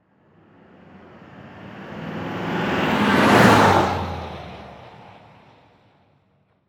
Transportation Truck Diesel Truck Drive By Medium

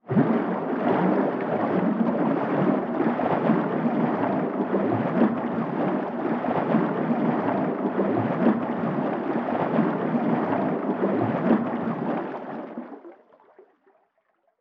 Underwater Water Splash Swim Movement

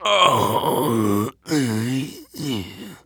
Human Male Grunt And Strain

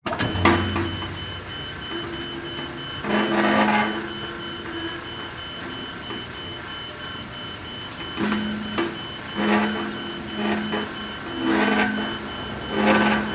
Emergency Electronic Security Gate Opening; emergency effects; AdobeCC

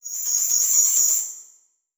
Transition Electrical Warble; Adobe; Production Element

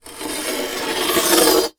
Industry Machete Scrape Wood

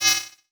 Multimedia Internet CD-Rom Flash Beep Low Pitch

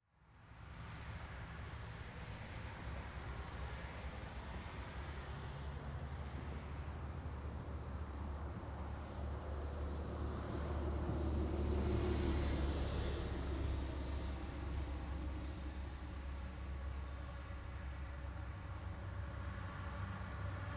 Ambience Traffic Two Lane Road 180D Light Traffic; AdobeCC

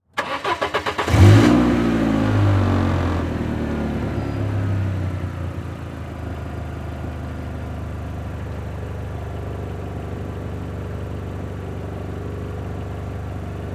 Auto Saturn Start Idle Shut Off Exterior; Transportation

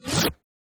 fwamf Short; CinemaSpice; Mograph Mini Transitions

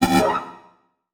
Title Transition Blurp; Production Element Title Transition

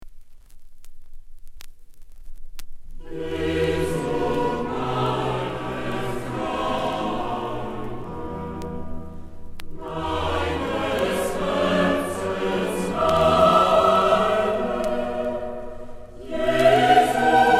Motet; BWV 227 I; Bachchor Stockholm; Bach 6 Motets

